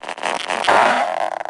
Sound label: damage spark